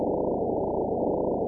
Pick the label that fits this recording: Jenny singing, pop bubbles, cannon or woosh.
woosh